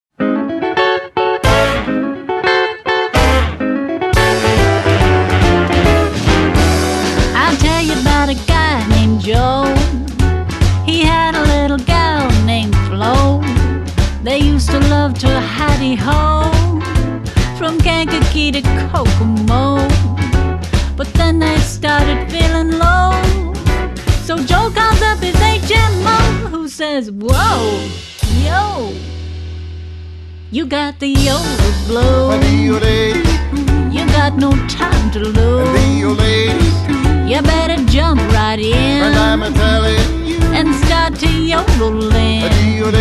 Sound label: Yodel Blues